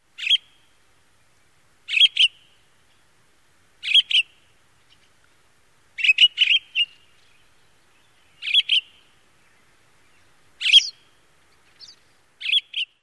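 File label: pine grosbeak calls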